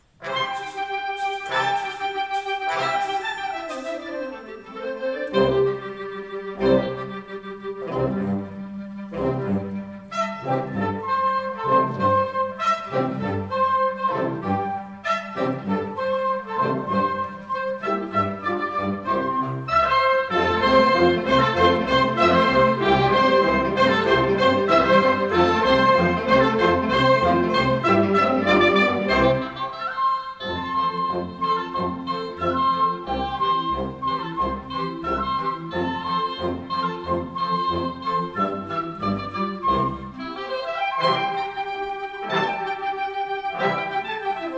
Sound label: Folk Suite; Get On Board, Little Children